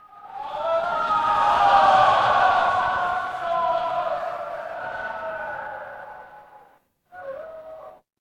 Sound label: crowd cheer